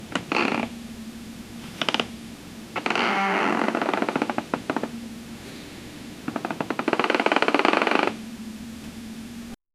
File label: a gentle repetitive creak, nursery